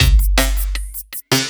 LOOP, Drum & Bass, 170 BPM

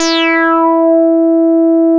E5, Bass; CRANDLE; E-MU Producer Series Vol. 6 – Analogia Project (CD 1); Boom da Bass 1

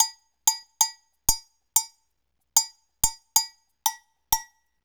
Soukous

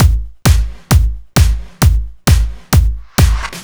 132 Bd Snr, 132bpm; Nu Dance Electro; Zero-G; Soundclash; Combi Loops

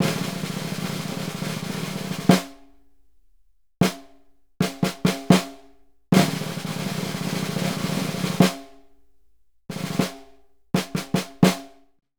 SNR WR PTN, Synclavier Percussion; ILIO; Synclavier Percussion 2; World & Orchestral

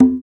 Congas, African Contemporary

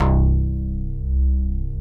OBDX GTR; Synclavier Sampler Library; KEYS AND GUITARS

